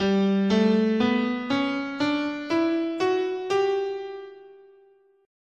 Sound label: G Lydian, scale